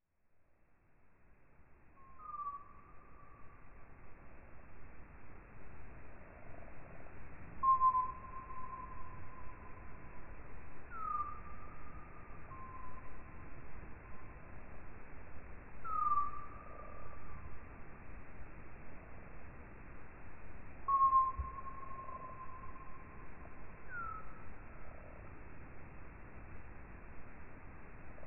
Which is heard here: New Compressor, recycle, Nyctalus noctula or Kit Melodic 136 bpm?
Nyctalus noctula